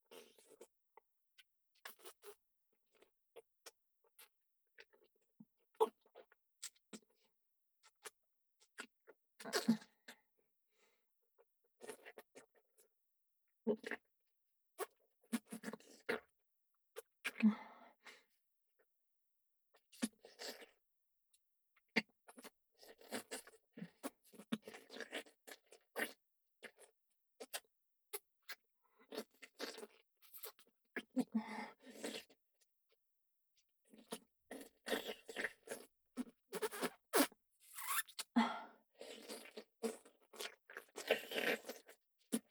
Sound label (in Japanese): フェラ, ASMR